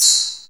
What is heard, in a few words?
Perc FX, Percussion; ProSamples vol.37; Best Service; Dance Synths